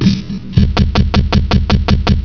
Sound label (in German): TAKT